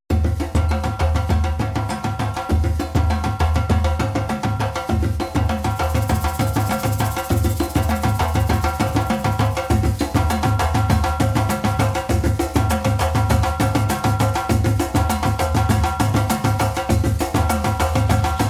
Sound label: African Drums